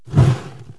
stove, house kitchen; KABOOM! - Wild and Wacky Sound Software

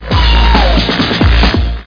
Minimize; KDancingbaby

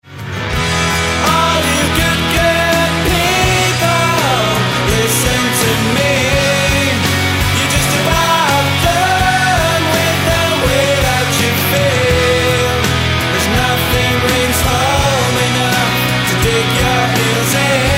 people; The Sunday Times - The Month

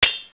Sword

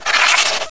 GROWL; Heretic II; monsters; assassin

